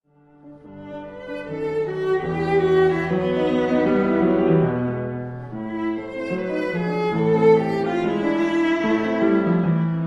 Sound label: Albumblatter For Viola Piano Allegro; Sitt; Op 39